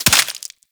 Headshot Impact Flesh; Warframe; Weapons; Bullet Impacts

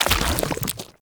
Step Sludge, Footsteps, Walk; Warframe; Characters; Tenno

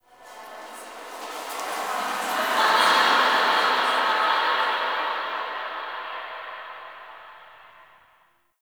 Memory Echoes, Ambience; Zariman; Warframe; The New War

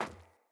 Sandals Run Metal, Footsteps

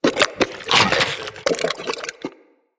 Shrimp Idle, Creatures; Vocals; Baliq Shrimp Glade

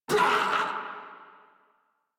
Death Crewmen; Dialog; Barks; Battle; Warframe; Corpus Crewmen; Crewman D

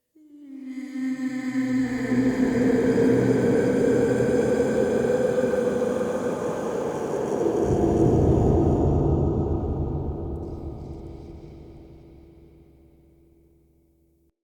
Spirit Lament, Ambience Crypt; Champion Crypt

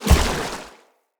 Step Water, Footsteps; Characters; Enemy; Mendicant; MndKing

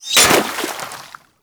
Kunai Impact Water; Warframe; Hit Effects; weapons

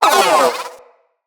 Companion Vocal Pain, Pets; Zanuka

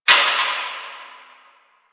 Fence Rattle One Off; Warframe; Ambience; Nineteen Ninety Nine

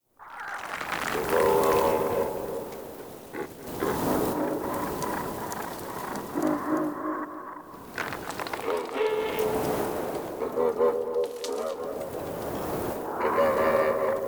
Infested Eris Exterior Critter Loop

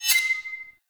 Short Sword Warning; Enemy; Swords; Sinecure Short Sword

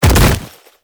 Bodyfall Light; Warframe; Lasrian Soldiers; Foley

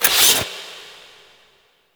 capsule start; Ambience; Warframe; Clan Dojo

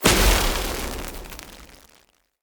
Hunter Sniper Shot Projectile Burst

